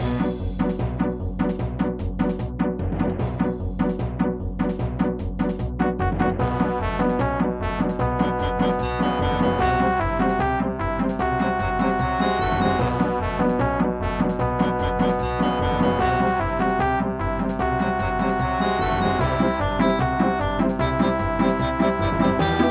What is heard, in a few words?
Stomper Room Symphony